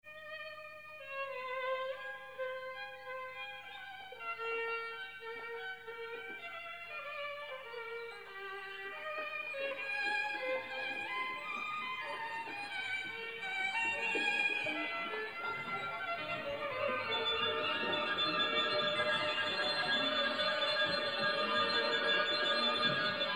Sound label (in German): Konzert fuer Orchester; Hauptsatz I Parallelen